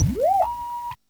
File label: wawa